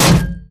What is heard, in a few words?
bullet heavyballistic titan; Titanfall 2